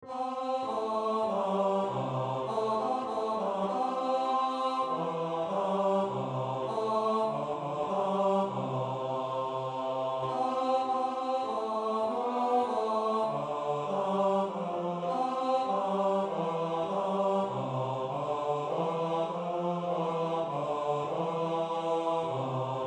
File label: Up Good Christian Folk and Listen Bass